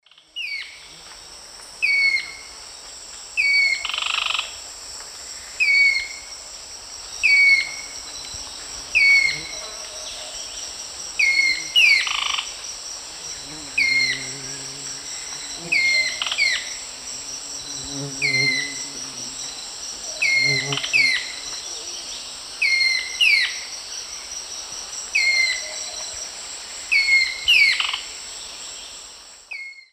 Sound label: Hyloctistes subulatus, song; S. America; Eastern Lowlands